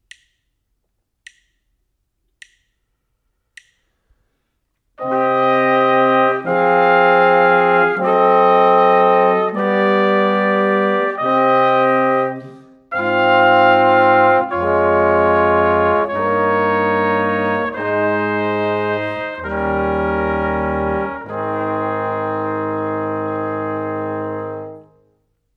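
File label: harmonic dictation, brass quartet; eartraining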